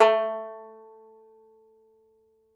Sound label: Tenor Banjo, Plucked Strings